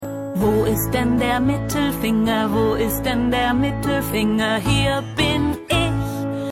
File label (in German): mittelfinger song